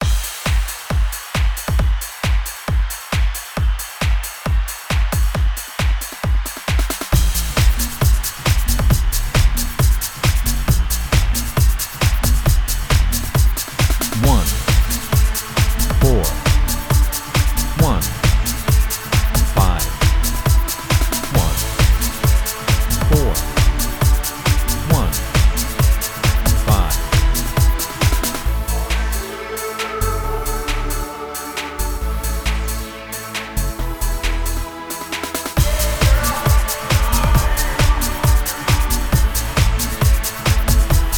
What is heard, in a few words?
I IV I V Dance